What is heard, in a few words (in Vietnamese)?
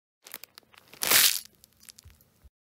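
tiếng bước chân giẫm đạp lên lá cây ngắn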